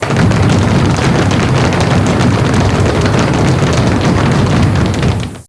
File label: spec Stomp